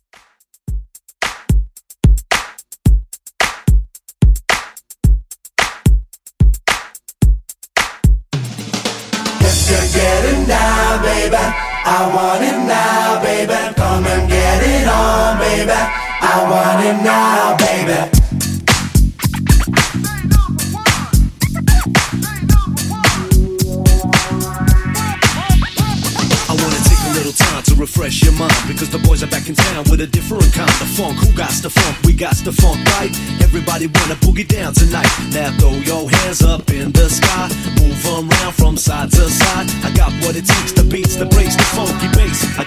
90s Hiphop

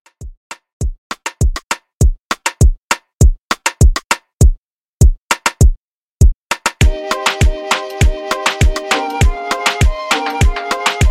Afrobeat Reggae 100Bpm

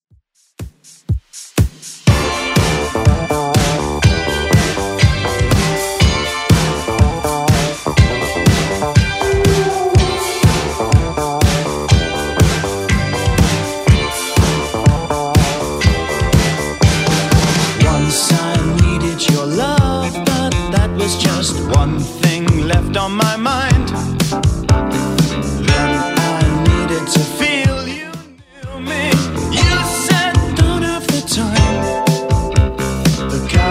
80s Redrum 122bpm

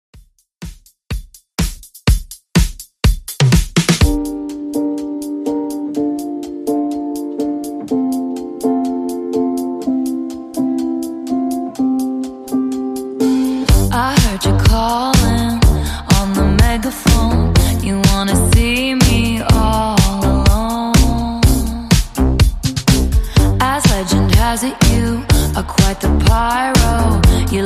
124 BPM; remixplanet

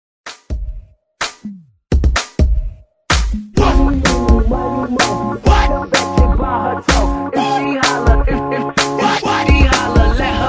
party breaks 127 Bpm

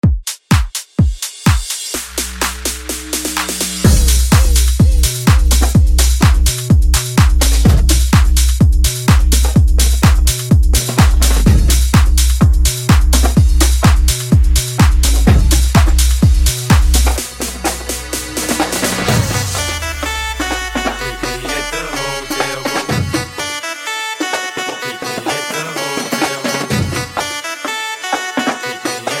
Tribal House Rework

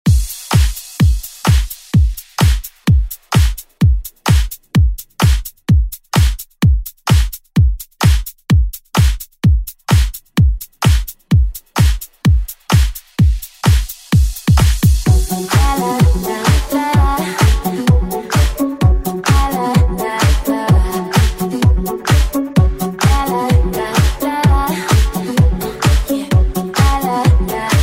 Pop Redrum; Deejay Hit

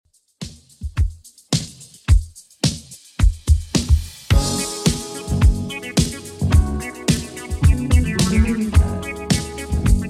80s Redrum 108bpm

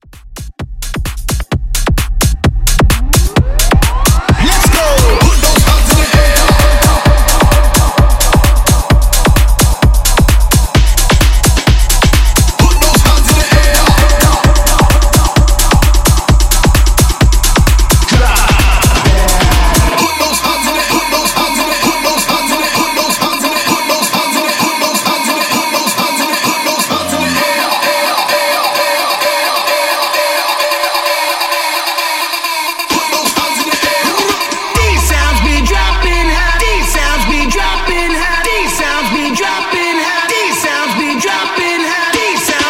Rave Mashup; Remixfreakz

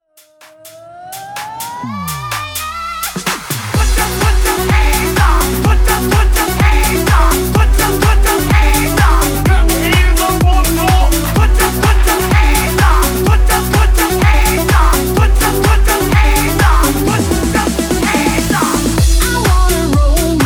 HYPE REDRUM 126 BPM

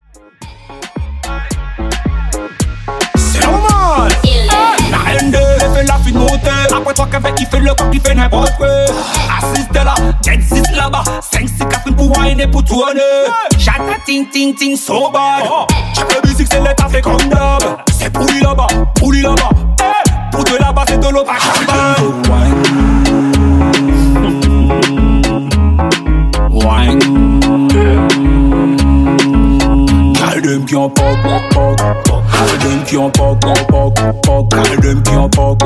Dance hall Extended 110 BPM

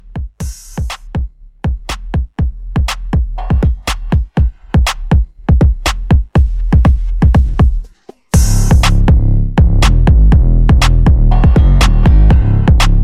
Intro Outro 121

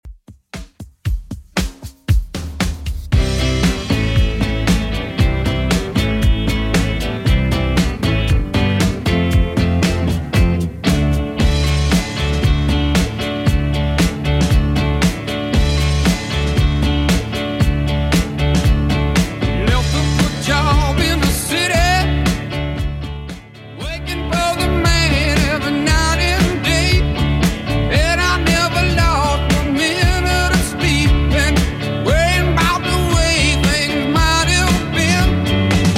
70s Redrum 116bpm

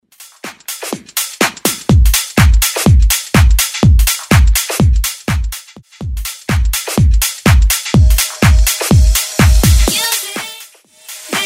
Bootleg House 124bpm

